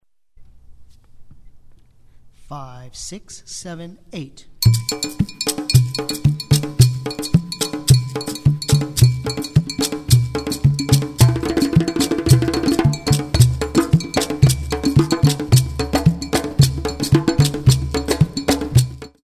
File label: drum